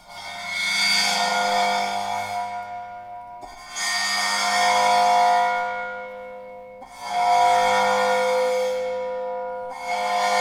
bowed cymbals; metallic

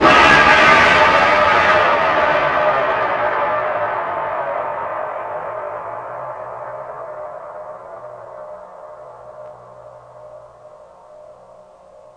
cym, drums

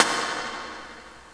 hihat, drums

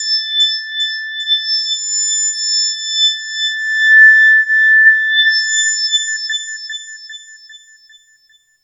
wheel, korg

